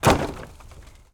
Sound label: impact wood debris fall hit; sonniss gdc